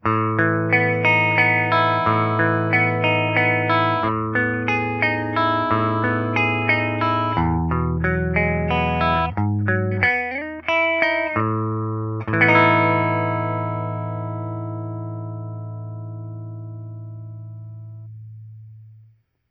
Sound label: electric; Stealth-XL